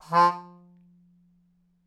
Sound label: Melodica Short; Short Notes Chromatic